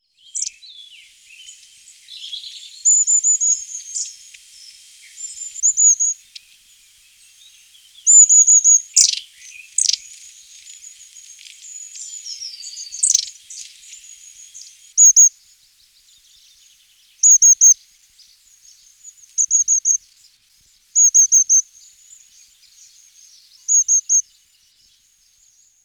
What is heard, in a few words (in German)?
schwanzmeise, gesang; singvoegel; roche 2004